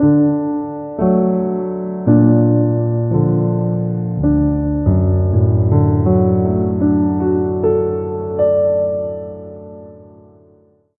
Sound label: Piano Sequence; mass effect 3 citadel gamerip 2013; Silversun Strip